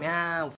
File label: voice message